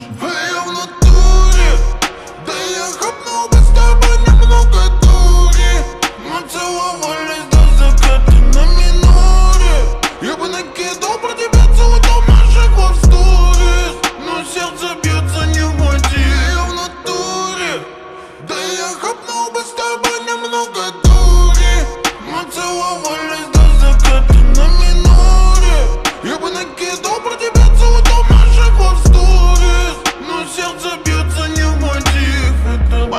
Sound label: slowed reverb bass boosted